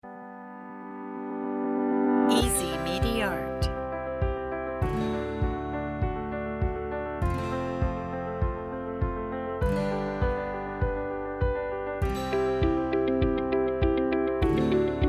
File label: corporate